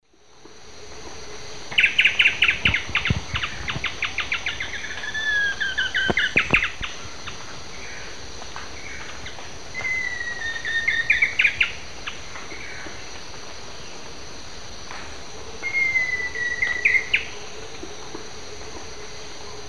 Xiphorhynchus ocellatus, song; S. America; Eastern Lowlands

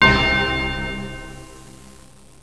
TANG, SYNTH

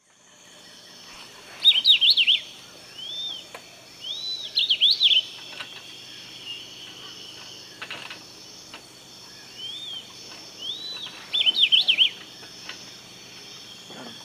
Hylophilus hypoxanthus, song; S. America; Eastern Lowlands

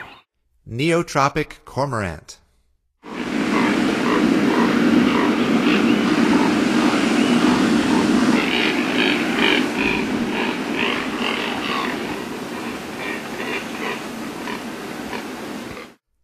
Neotropic Cormorant; North America; Stokes

